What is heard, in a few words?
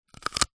human bite carrot